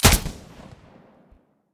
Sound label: aug muzzle stereo, weapons; STALKER; IWP